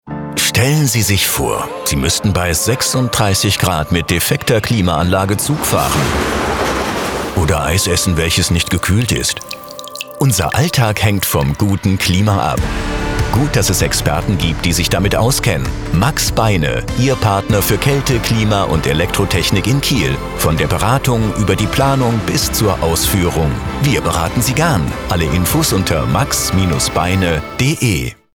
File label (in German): image musik, online radio ads